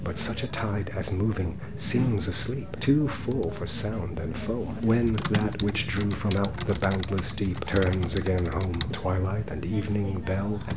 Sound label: background noise example, call quality; ringdna